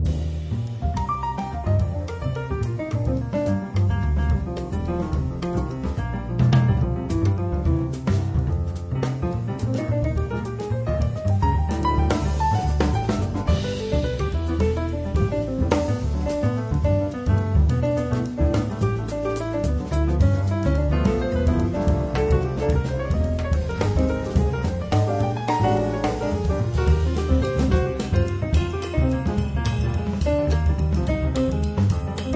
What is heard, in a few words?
Music Box